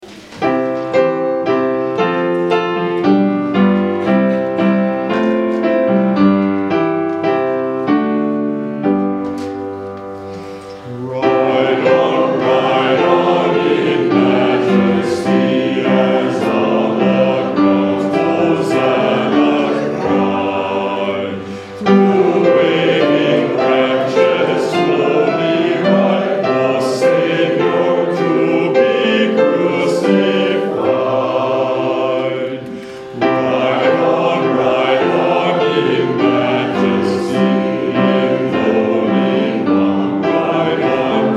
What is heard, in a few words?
Hymn; Ride on, ride on in majesty